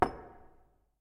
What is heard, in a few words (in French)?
Colision Glasse